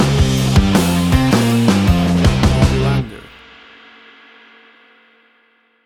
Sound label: Big Rock Metal Riff Stinger